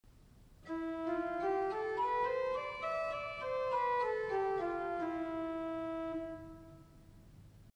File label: Phrygian